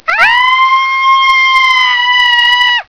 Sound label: screem